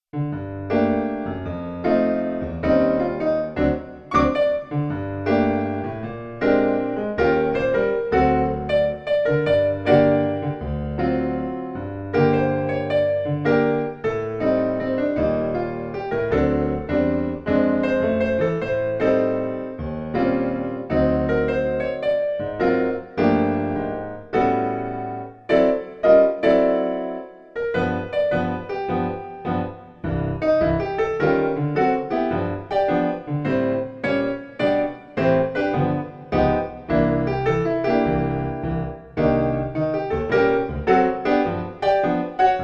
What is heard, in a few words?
subway play for tips keys; The Sims 3; windows gamerip 2009